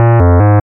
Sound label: Error